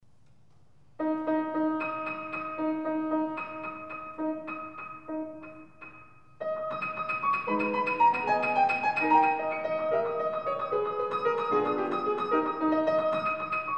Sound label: Pro Piano; Altan Pro Piano; La Campanella